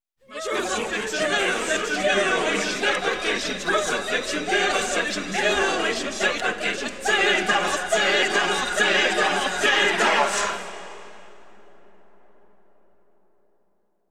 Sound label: choir chanting